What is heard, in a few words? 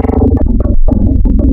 clavinet; Adventure Kid